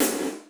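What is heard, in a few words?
snare drum GATED; Crypton; DrumBase5000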